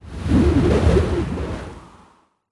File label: Golf; Sfx Golf